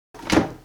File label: wooden Crate Close